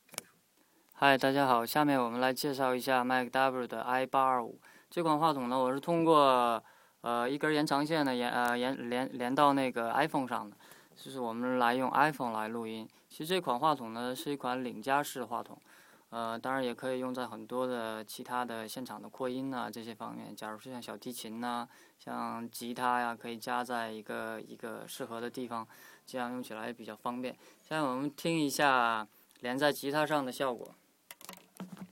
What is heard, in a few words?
Guitar